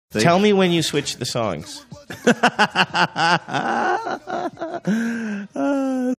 laugh; tell me when switch songs

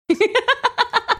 laughing

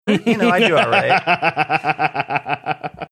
laughing

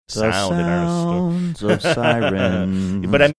singing the sounds of sirens

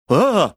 recoiled reaction sound

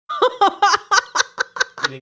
laughing

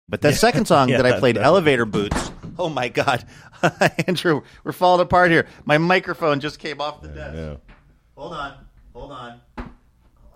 microphone fell off his desk

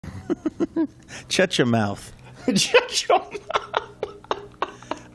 mouth laughing

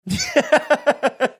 chuckling

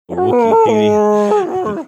attempting chewie impression

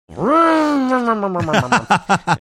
making motorcycle revving sounds

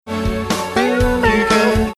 making guitar sounds

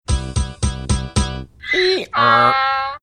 making donkey braying sound

